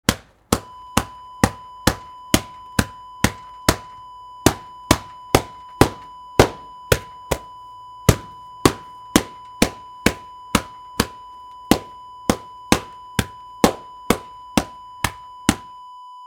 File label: rug beater